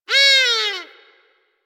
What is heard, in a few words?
Forest Animal Cries; Warframe; Ambience; Grineer Forest